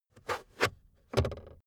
car backseat table pull out cupholder slow bounce

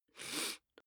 nose sniff male sick soft; soundpool

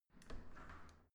entrance door open normal

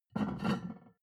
plate on plate take soft, restaurant cafe

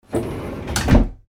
door sliding close

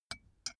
put tea spoon in cup; berufe; gastronomie; soundpool

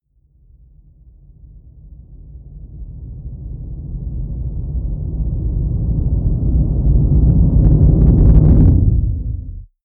deep noise synth rumble distort, risers

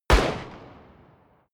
rifle distant shot; comedy-cartoon; pistolen-gewehre

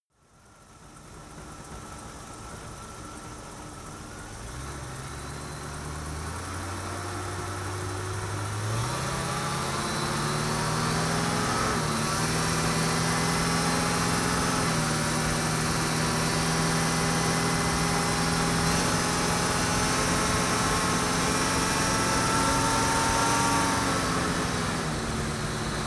vintage car chevrolet 57 accelerate engine; verkehr transport; autos

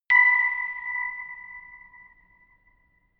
notification glockenspiel creepy single

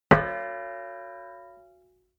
plate hit ring stopped; hits impacts

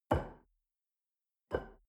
shell upside down grab from table hard; soundpool

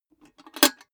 cash box metal put down handle; maschinen; soundpool

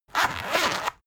zipper run long; objekte; reissverschluss

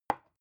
glass put down; objekte

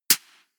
clap, percussion

